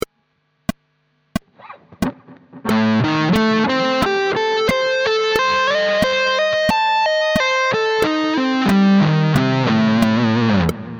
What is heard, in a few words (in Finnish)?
Am7b5 patteri